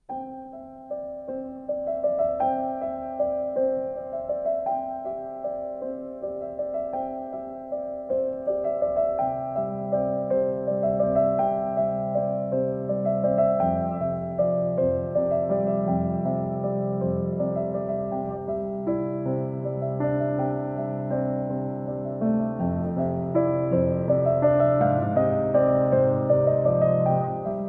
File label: Morning Mood Variation (Arr. For Piano)